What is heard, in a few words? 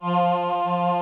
VP CHOIR, VOCODER; Greytsounds VOL-1